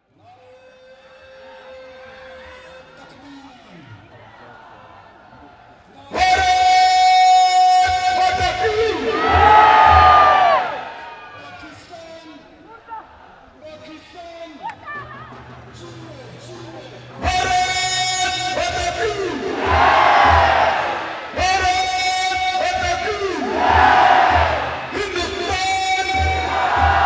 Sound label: Wagah Border Ceremony India Pakistan Border; Samuel Corwin; Selected Field Recordings from India and Nepal Volume II Religious Music